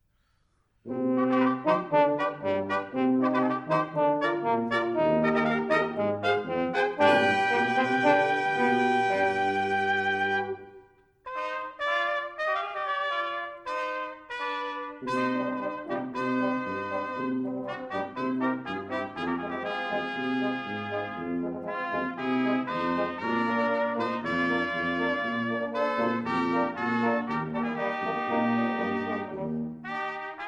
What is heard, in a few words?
mariachi